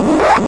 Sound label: Electro FX; Ueb HE Electro FX